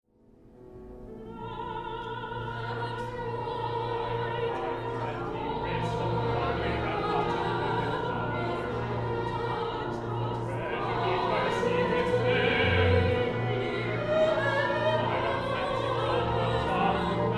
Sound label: Trio